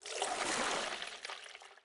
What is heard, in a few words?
water pour; smite; god geb skin7